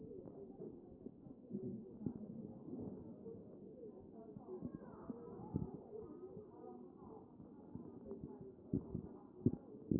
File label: chest